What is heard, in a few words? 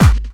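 snare drum bass drum, Dance Mega Drums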